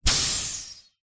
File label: foley air puncture air release hiss; zapsplat